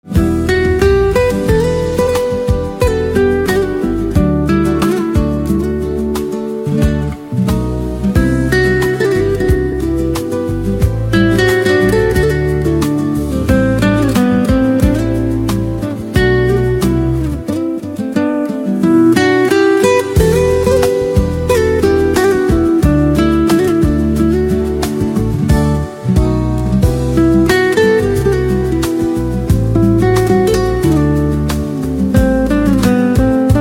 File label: Guitar, instrumental ringtones